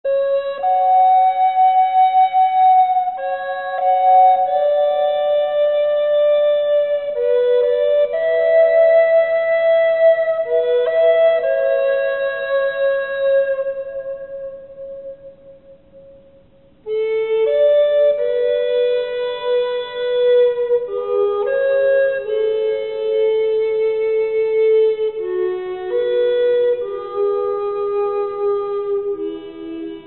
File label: healing flute; musique therapeutique